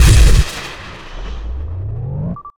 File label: fire, plasma, weapons; Dystopia